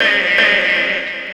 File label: Fx Vox; Equipped Music; Loungin House